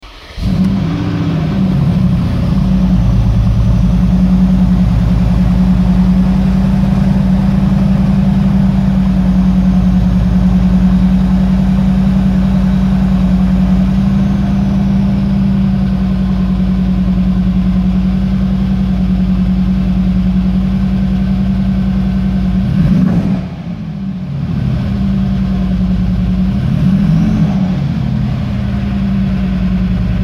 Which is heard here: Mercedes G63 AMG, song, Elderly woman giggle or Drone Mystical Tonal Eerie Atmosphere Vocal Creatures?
Mercedes G63 AMG